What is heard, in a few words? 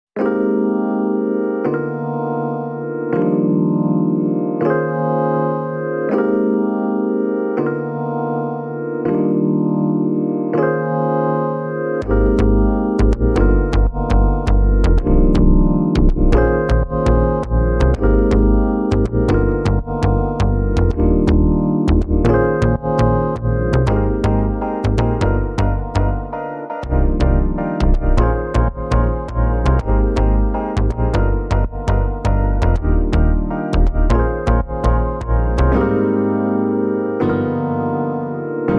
No Drums Reduced Arrangement